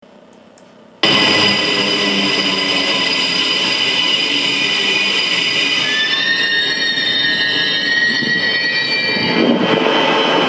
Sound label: short brake squeal